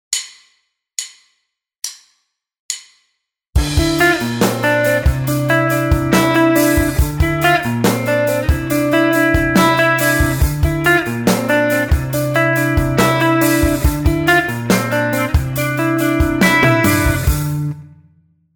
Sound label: Riff, guitar; Pickipedia: learn to play 23 rhythm guitar riffs